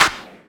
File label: CLAPS HIP; Best Service Dance Mega Drums